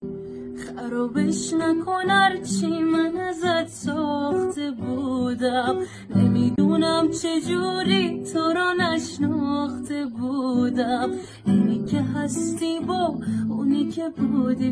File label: Guitar